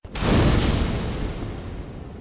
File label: Flare Sound